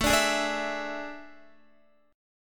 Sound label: A Major 7th Suspended 4th, Guitar; strummed; JGuitar